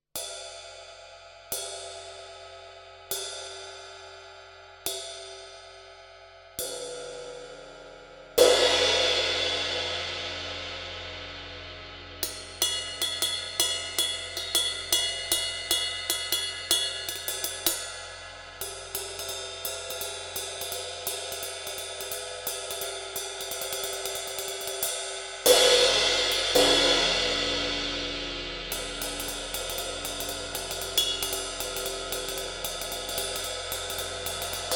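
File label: ride BYZANCE PING TRAD 20, CYMBALES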